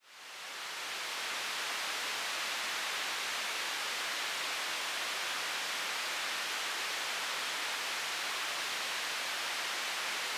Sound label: surf; noisepalette; sirenfx